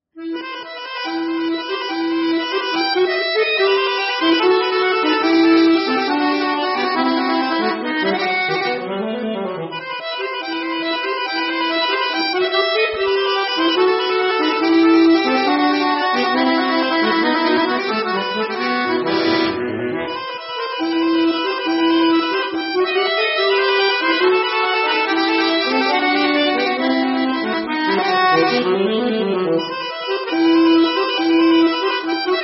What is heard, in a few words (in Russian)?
баян; Л. Дакен